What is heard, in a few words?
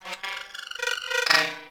Beep Stutter; Warframe; Corpus